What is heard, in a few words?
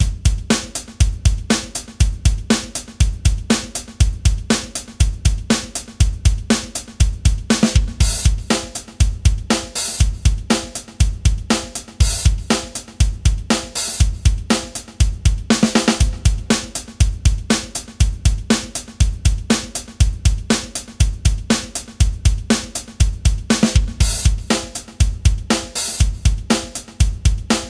Rock Rock Kit